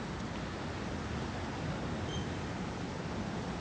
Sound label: surf loop; PaxImp